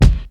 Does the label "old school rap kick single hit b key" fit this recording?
Yes